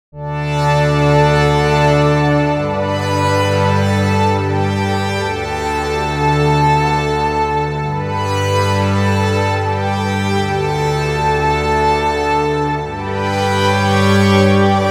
1492 Lead Lines

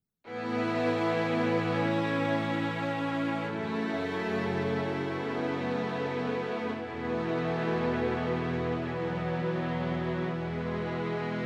Strings

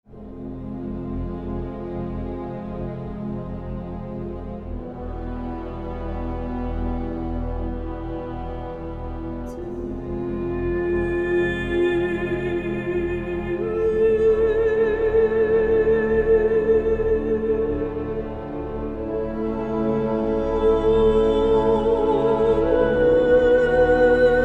Voice; Elven dawn

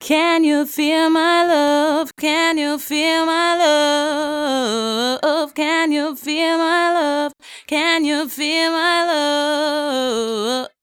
Vocal A B; Golden Age EQ-81 MkIII

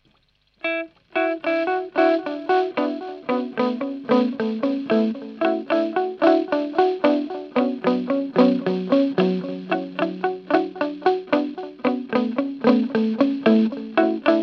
Delay slow

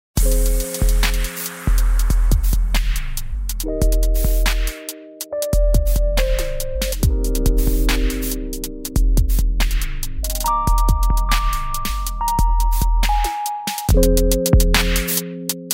Drumloop Maximizer Exciter; WaveLab Cast

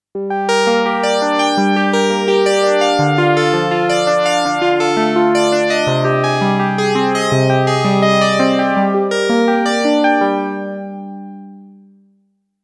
OB-X8 Vintage

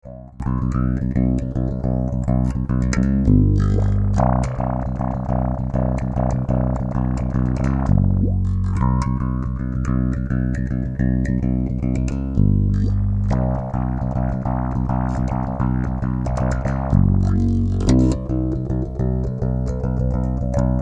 KAOSS Infinite Filter

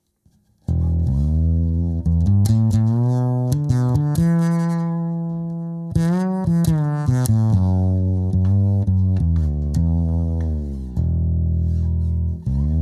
Godin; NoBass; fullMid; Full High

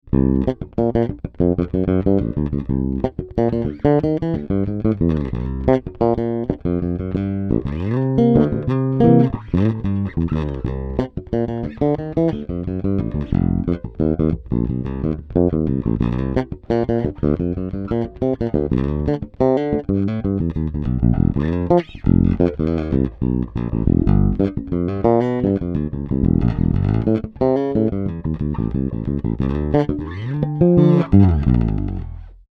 Fingerstyle Bridge PU

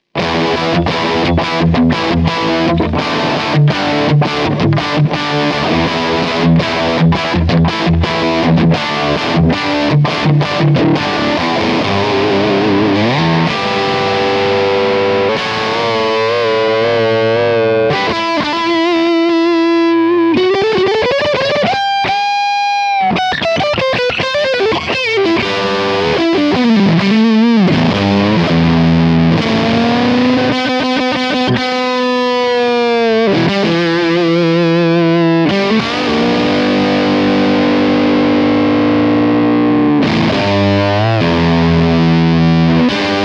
Fulltone Queen Bee Fuzz; Kamikaze

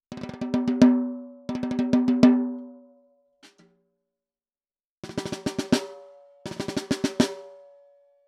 Shure KSM11 Snare

